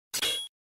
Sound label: Equip